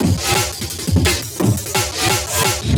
Pulled Apart, Loops, breaks; m8 breaks

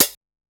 Hat Closed, classic machines dx

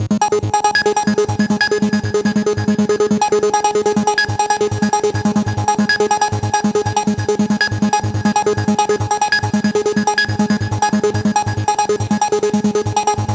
140BPM G#; Vengeance - Essential Clubsounds Vol.4

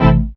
SYN, dance synth

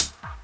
Dance Drums

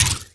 Vinyl Fx; Sonic Foundry (Sony Creative Software); Esoterik Beatz

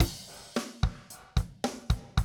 Classic DrumLoops, 110

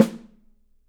Studio Snare; 3D Percussives; Studio Snare 01

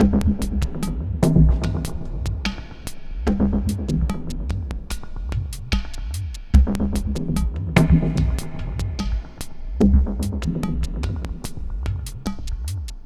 Terrestrial Hybrid, Processed Percussion

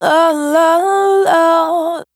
Urban Dry, Single Voice Melodic Loops

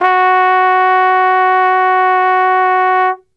F#2, Brass; Best Service; Brass Super Section

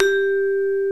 MARIMBA VIBES

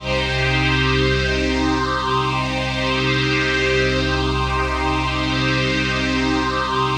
PHASE PAD; Best Service; XX Large Pads

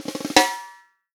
snare drum, POP SNARE CHROMATIC

